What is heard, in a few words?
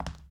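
Foot Hard Wood Shoes Walk; Lucius III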